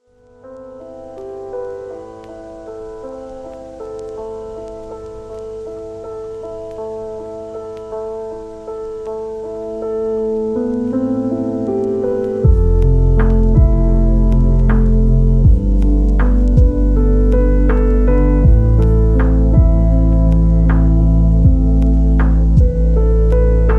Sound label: lofi